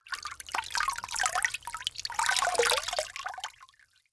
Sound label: Liquid Water Water Dripping